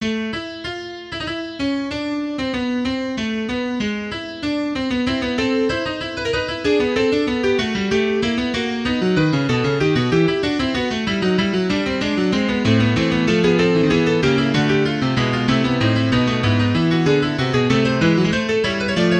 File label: Baroque Fugue Exposition